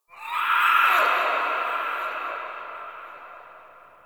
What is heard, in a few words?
Interior Texture Painful Screech, Infested, Ambience; Misc Indoor